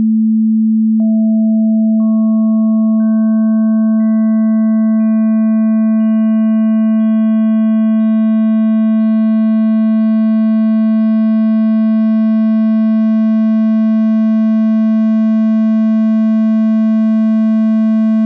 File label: Additive 220Hz Triangle Wave